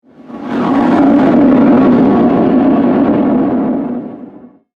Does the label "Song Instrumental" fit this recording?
No